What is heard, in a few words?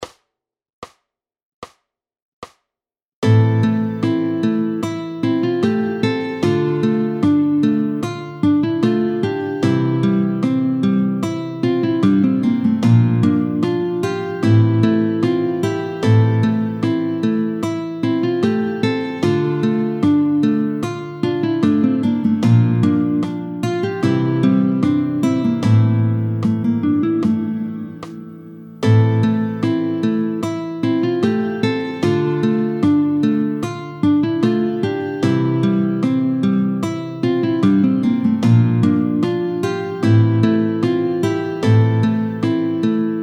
Guitar